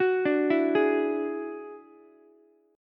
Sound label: F sharp 7th Suspended 2nd Sharp 5th F sharp down Ukulele; F#7sus2#5 arpeggiated